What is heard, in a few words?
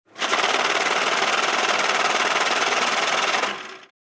Cash counter machine